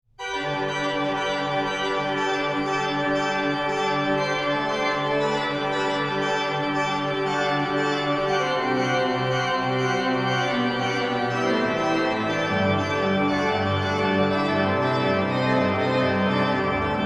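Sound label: toccata joyeuse, organist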